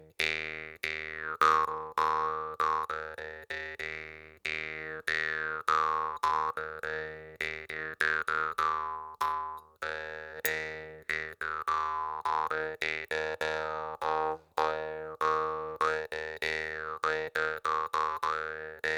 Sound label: Jaw Harp